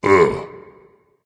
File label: ugh dragon; fafnir